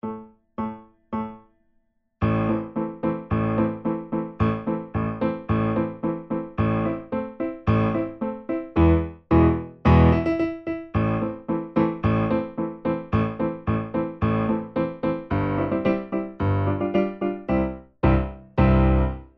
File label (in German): Secondo, Vierhaendige Uebungsstuecke; Playbacks; Diabelli